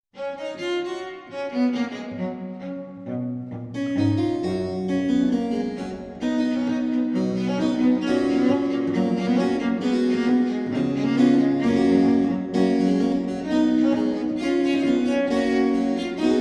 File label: pipa